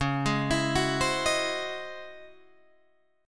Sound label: C sharp Minor Major 11th C sharp Guitar Drop D